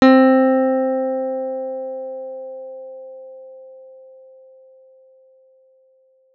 C4, pianos; Modelling sound generation in pianos

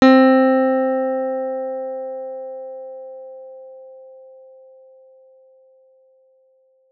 C4, pianos; Modelling sound generation in pianos

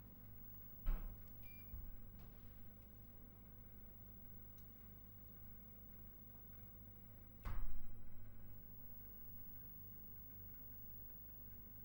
CRT TV Source

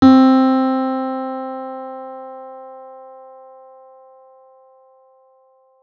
C4 minimal, pianos; Modelling sound generation in pianos

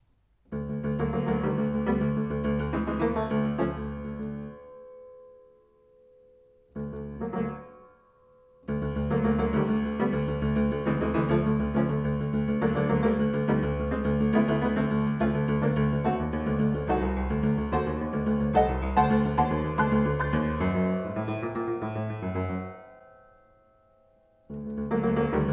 Erard 1870; Liszt Gnomenreigen

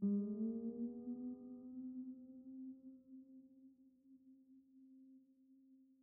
Piano Auto tuned Notes; Piano Nudes

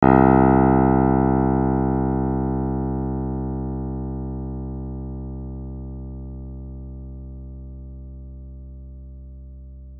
C2, pianos; Modelling sound generation in pianos

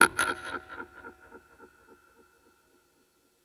Pedal Audio Effect; Partial Arenas

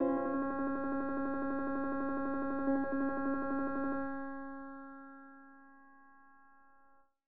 Piano Arpeggiated Single Notes; Piano Nudes

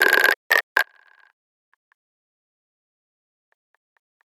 Pedal Audio Effect; Partial Arenas

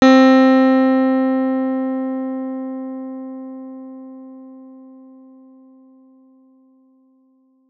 C4, pianos; Modelling sound generation in pianos

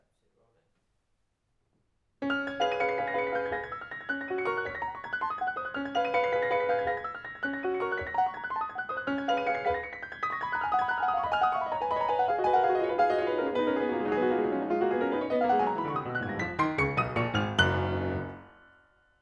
Steinway 1905; Liszt Gnomenreigen

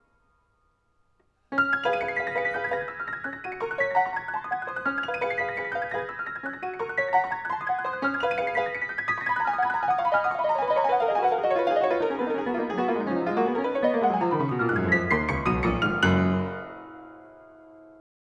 Erard 1870; Liszt Gnomenreigen

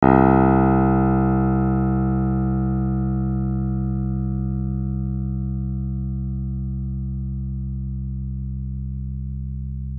C2, pianos; Modelling sound generation in pianos

